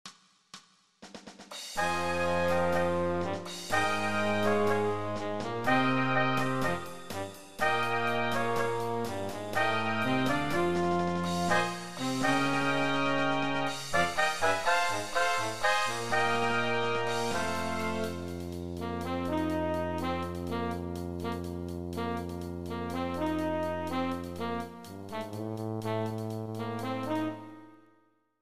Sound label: Fragment 124 bpm